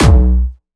C2, Jumpstyle Kick; Bassdrums; Jumpstyle Multis; vengeance essential club sounds-3; VEC3 Bassdrums

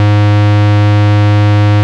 Ultimate Bass G# Root G#; Vengeance Samples

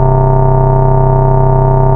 Ultimate Bass Root C; Vengeance Samples

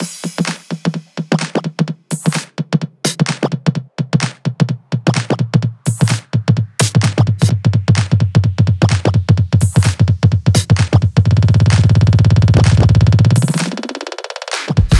128BPM Toolkit, House Electro Fills; 8Bars; Vengeance Samples

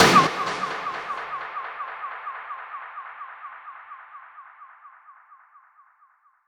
FX Downlifter

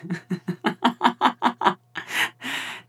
Ambiences, Places and Ambience

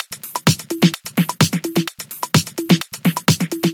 House Loop, 128 BPM; Vengeance Samples

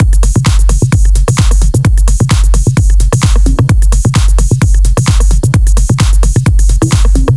130BPM Kit; Pressure 1 Kit

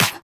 Clap; Vengeance Samples

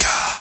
Percussive FX; Vengeance Samples; VEH1 Percussion

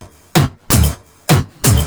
Electro Loop 128BPM; Vengeance Samples

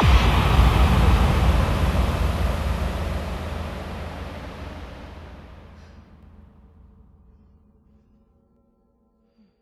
Bassdrums FX, FX Kicks; Vengeance Samples

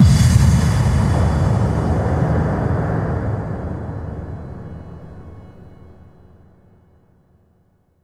Hits & Explode

